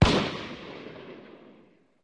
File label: Gunshots Single; fires